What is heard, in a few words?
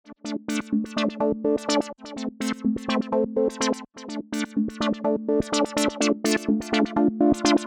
Pulsator, 125bpm